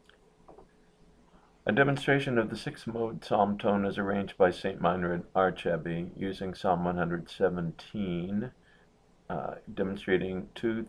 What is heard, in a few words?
psalm tone vi